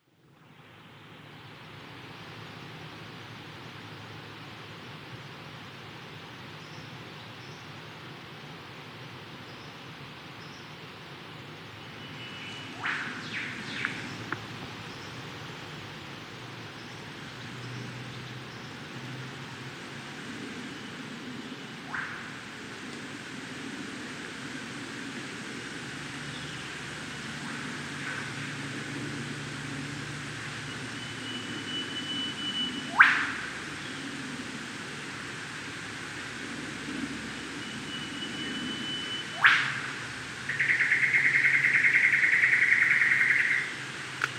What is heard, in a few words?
binnaburra rainforest